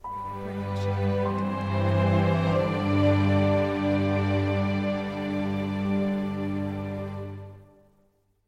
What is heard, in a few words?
Inspiring Ident; SoundsCrate